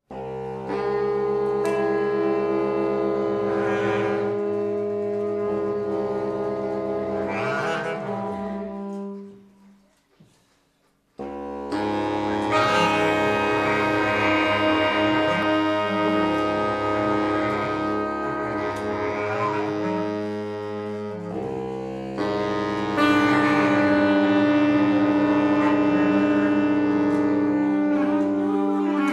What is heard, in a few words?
SAX MAFIA